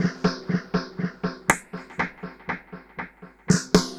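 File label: Drums Snare Echo 120, dub drums samples, 120bpm; musicradar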